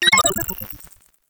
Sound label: glitchy language; sound effects